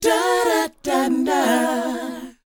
DOWOP F#4; ILIO; Vocal Planet

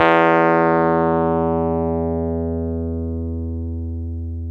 Wurly, Keyboards of The 60's and 70's

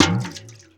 WATER BUCKET, percussive; Rarefaction; Poke in The Ear With A Sharp Stick

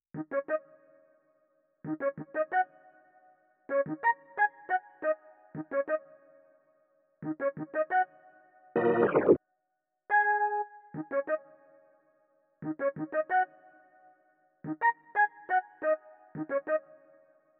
Melody; Sonic Foundry (Sony Creative Software); Westside Underground; Product

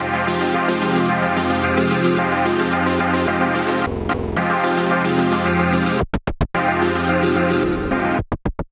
110 Fm Glitch Pad, Melodic Loops; Electronisounds

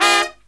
low chord, brass riffs; USB Soundscan vol.29; AKAI

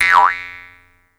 jaw harp

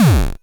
Drums, Hits; DCM8

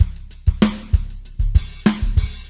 Fast 16 096, Beat Loops; Zero-G; Monster Beats

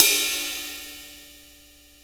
Drum Dry; East Collexion; Drum 1

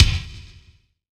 BD, BASS DRUMS; EdgeSounds

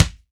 G-KICK, MG KICK

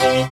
HOUSE, House Dance; 90 s Sample CDs; Best Service; Dance Mega House Dance

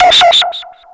ALARM, SCI FI ALARMS; Zero-G; Ambient 1

